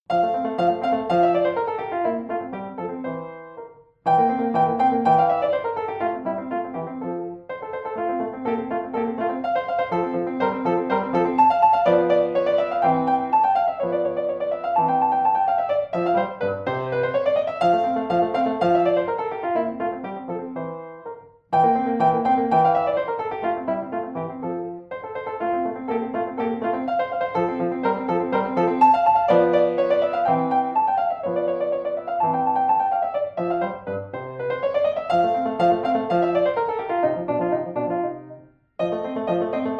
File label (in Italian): Sonatina in fa maggiore per Pianoforte Allegro Assai